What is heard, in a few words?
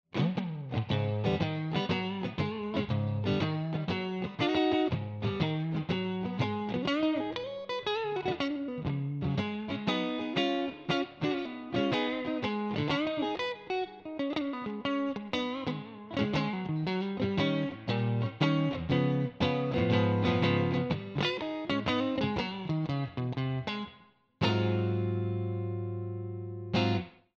full, guitar; LLV 66 V CHAMP